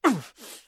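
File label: moan, male; Interactions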